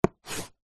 office chalk stick thick draw line on small chalk board